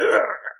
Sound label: large pain